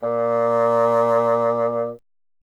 bassoon A#; Solo Instruments; Miroslav Vitous

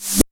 snare, techno 138 BPM

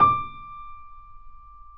Mp D 5 off, Grand Piano off mic; AKAI S6000 CD-ROM - Volume 2

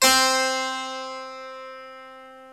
Harpsichord

